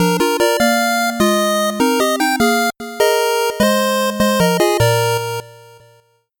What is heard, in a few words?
8bit jingle slow